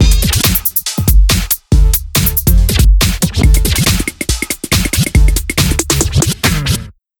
emote breakdance music; fortnite emotes; athena

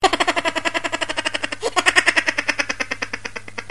haha; css; poison

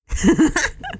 laugh evil; femscout

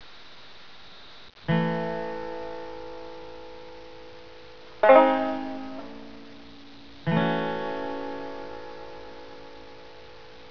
Banjo

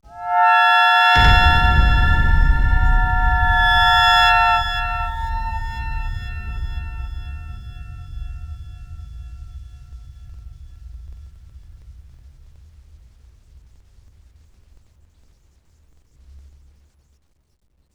scare; slender; underhell

